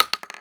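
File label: 12 caliber shell wood; Escape from Tarkov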